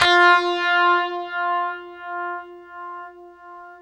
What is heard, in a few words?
UNIVIB F, Guitar; East West; Steve Stevens Guitar Samples Collection